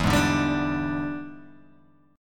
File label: E 7th Sharp 9th Guitar Open G, chord